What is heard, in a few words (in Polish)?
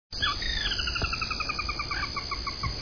kureczka karolińska - wołanie